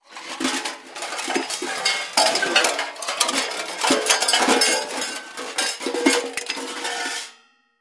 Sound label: Junk Search Through J, junk crashes